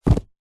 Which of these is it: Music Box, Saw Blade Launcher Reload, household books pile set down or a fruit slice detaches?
household books pile set down